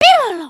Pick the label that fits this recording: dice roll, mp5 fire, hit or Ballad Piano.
dice roll